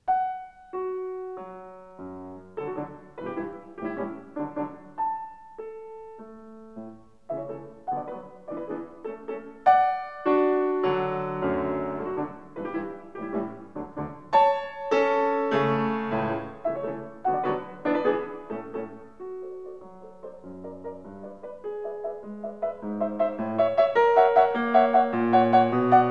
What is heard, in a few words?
Piano Sonata No. 15 in D major; Wilhelm Kempff; Beethoven; Piano Sonatas